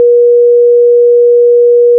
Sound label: beep